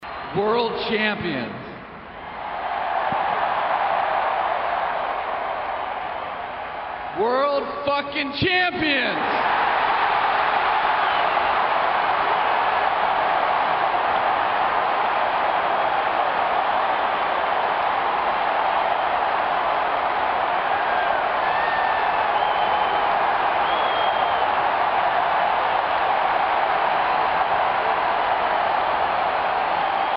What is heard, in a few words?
CROWD